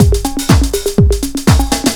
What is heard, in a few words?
LOOP; SoundFactory; DRUMLOOP